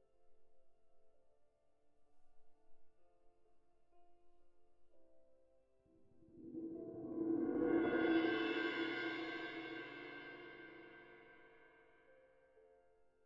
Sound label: Cymb Close